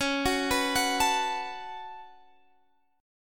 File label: Db 7th Suspended 4th Sharp 5th Db down Guitar Standard; arpeggiated; chord shape; JGuitar